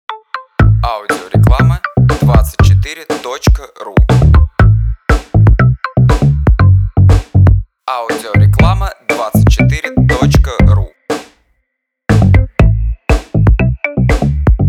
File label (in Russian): Dzhingl